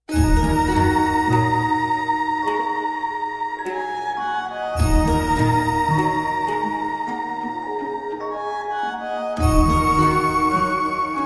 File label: Harp